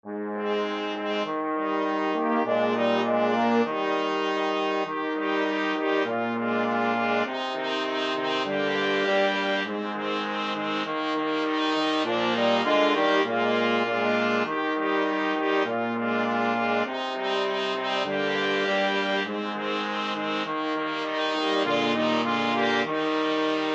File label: brass quartet